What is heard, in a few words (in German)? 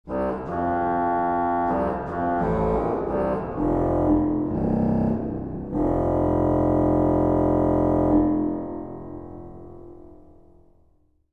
posaune